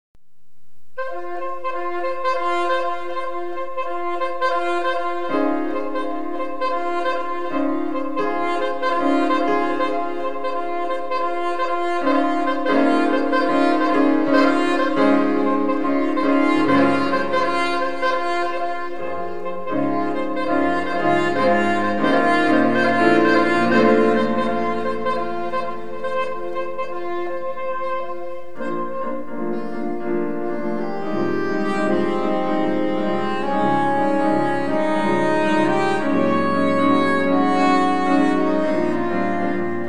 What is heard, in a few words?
Soprano Saxophone And Piano; Jazz Composition; Jazz Composition Showcase 1998; CLCM Students